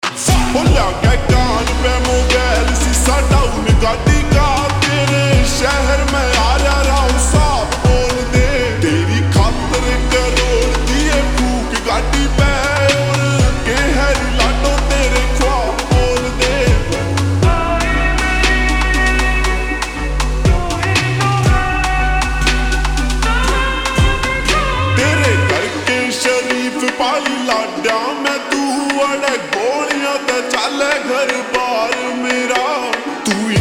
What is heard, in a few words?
Ringtone Slowed Reverb